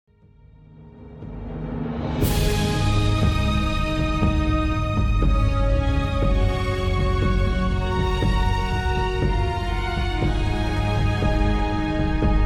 Good team victory; Age of Chivalry; Windows

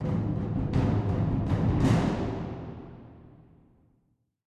Battle End; Mass Effect 2; Harbringer

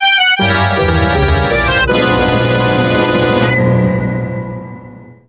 Death; SpongeBob Revenge of the Flying Dutchman

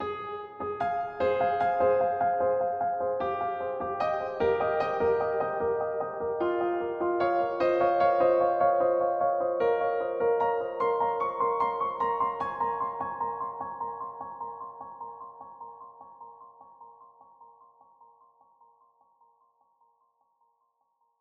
End Sound; life of slime 2023

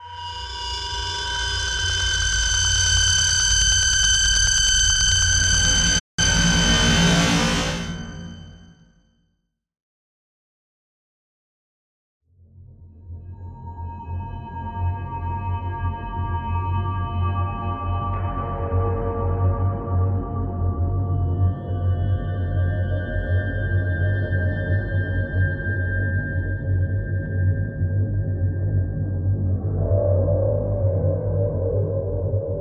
Cutscenes Music; Serious Sam 4; Volcano

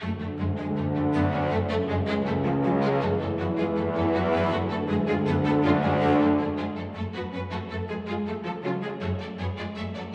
Suspense; Lucius

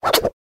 Quick Swoosh; Infernal Radiation

